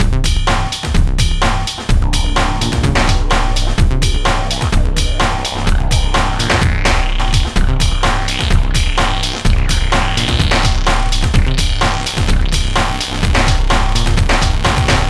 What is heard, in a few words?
Scrubbed Clean 127 Fmin, hardcore breakbeat; Big Fish Audio